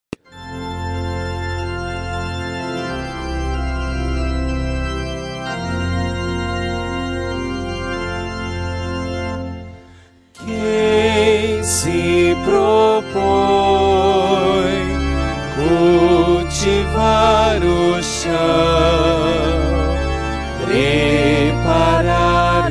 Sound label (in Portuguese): Canto de Ofertório Missa; Missa de Aparecida